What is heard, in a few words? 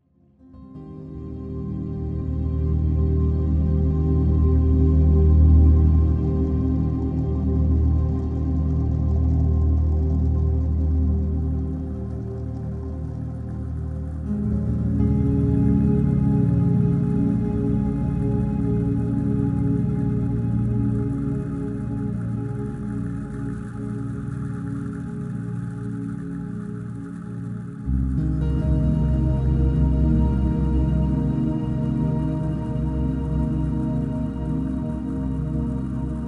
Relaxing Music